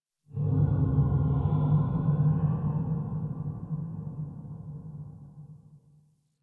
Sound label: Exterior Distant Calls Scared, Ambience